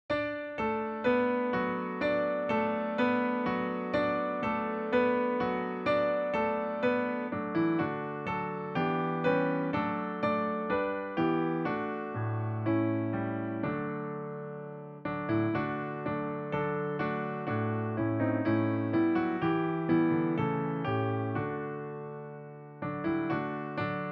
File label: sweet chiming christmas bells; salvationarmy; Accompaniment Tracks